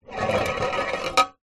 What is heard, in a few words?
food tin can roll on wood surface then hit side; zapsplat